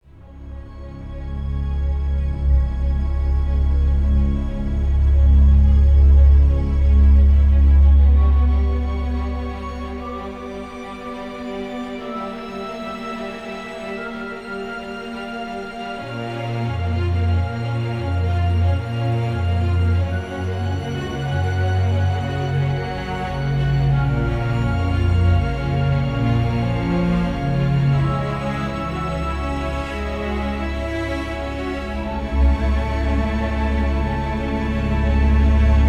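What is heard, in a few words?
Music Transition Long; from dust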